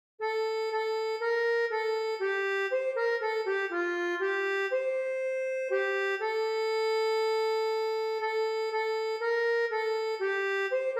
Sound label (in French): ACCORDEON